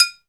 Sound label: GLASS, perc; Zero-G; Funky Elements